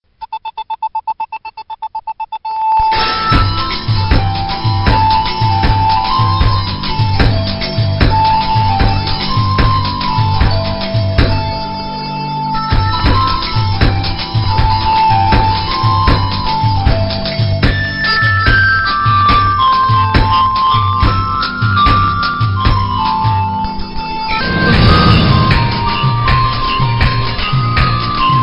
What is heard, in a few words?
Folkish Style, Sms Ringtones; Airtel